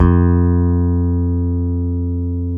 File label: BS P BASS, P.Bass